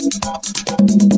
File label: DRUMS PERCUSION; multitracks